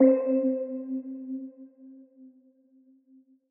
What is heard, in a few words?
KEY (C); Melodic One Shots; Changes